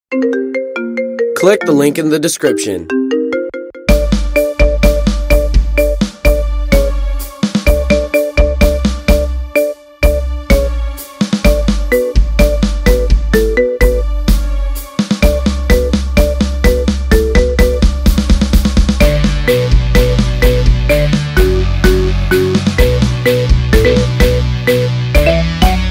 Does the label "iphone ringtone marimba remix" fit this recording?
Yes